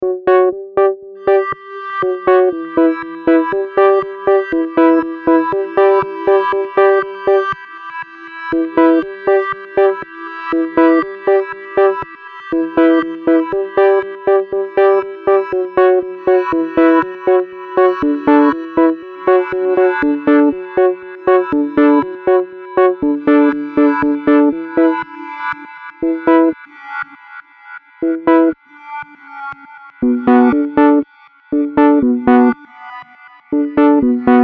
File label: arabic rast on c modern 120